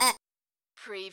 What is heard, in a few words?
CARTOON SILLY HORN HONK